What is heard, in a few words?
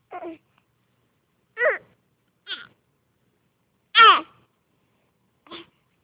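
baby hungry, female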